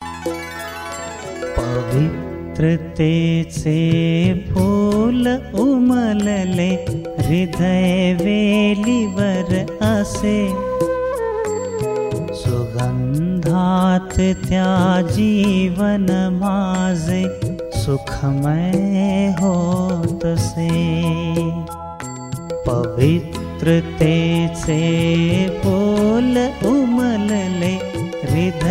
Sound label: Marathi full tone ringtone